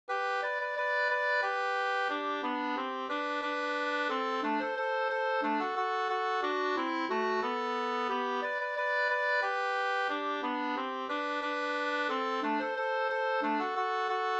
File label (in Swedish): vals